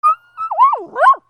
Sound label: DOGS